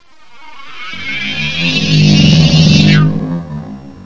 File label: particle; HIGHTECH